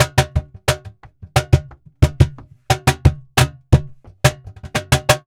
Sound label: flamenco percussion, tango; ProSamples vol.46 - Flamenco